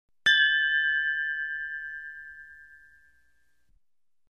crystal clinking together

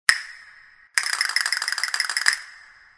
castanet

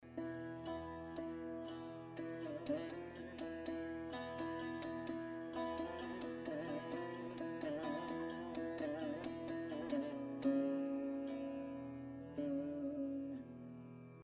india bgm

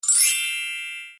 add correct short

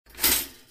household toaster pop up

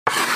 office chalk draw lines on blackboard short

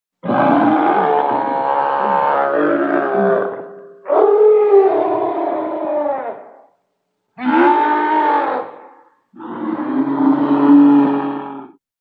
gor voice

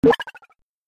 tech break fail

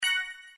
multimedia game star win gain slow; zapsplat

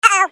wrong

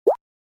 droplet button

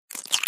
crack egg

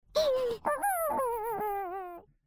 cartoon crying

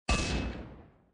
device error

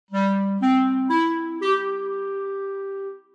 clarinet

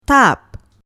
tap

